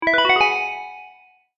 完了

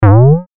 短い音 ポヨン

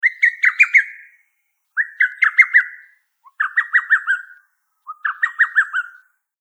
ホトトギス

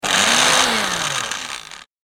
料理用ミキサー

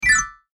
チェレスタグリッサンド

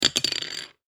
えんぴつ 転がし

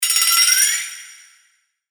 キュピーン

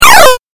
8bit 下降

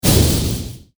着火

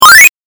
8bit かわす

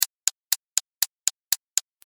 制限時間

